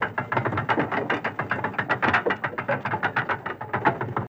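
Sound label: Drawbridge Short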